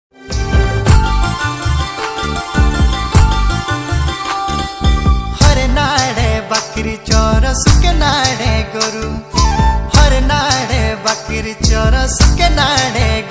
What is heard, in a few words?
uttrakhandi ringtone